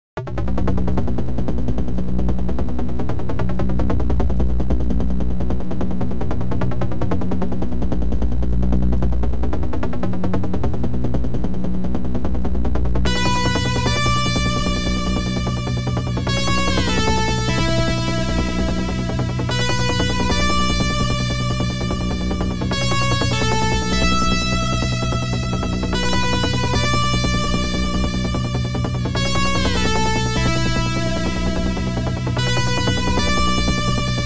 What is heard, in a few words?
OPL2; Tyrian